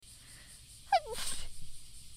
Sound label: Sneeze